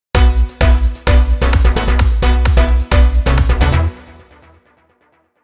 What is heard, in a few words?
Ringtone; Devilman Crybaby Original Soundtrack